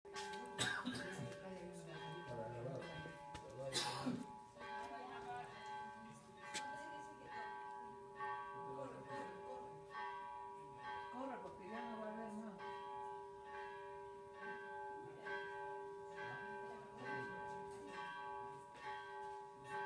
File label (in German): glocken